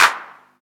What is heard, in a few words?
clap sound f sharp key, Royality free clap one shot tuned to the F# note. Loudest frequency: 2216Hz; percussion; single hits one shots